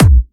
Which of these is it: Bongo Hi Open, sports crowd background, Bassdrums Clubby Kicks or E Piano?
Bassdrums Clubby Kicks